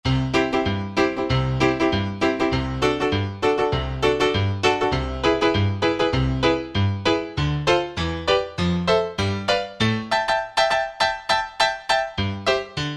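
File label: Acoustic Piano 2, Roland JD-990; Factory Patches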